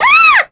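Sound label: Vox Damage, Korean; Annette; kingsraid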